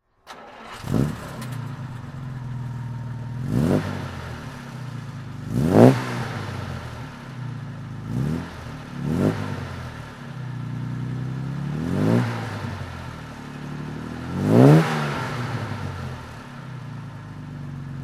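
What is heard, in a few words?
jaguar xk150 34ots throttle, Engine sound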